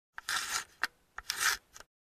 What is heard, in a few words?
Camera Rewind